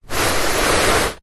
household dustpan brush sweeping carpet single long slow sweep; zapsplat